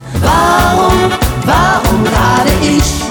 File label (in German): Klingelton